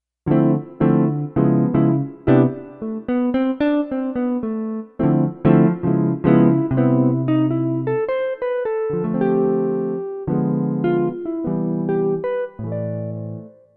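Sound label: Jazz Guitar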